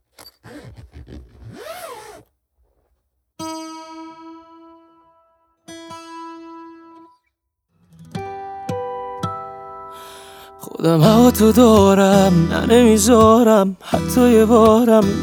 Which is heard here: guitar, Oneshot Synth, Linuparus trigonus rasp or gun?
guitar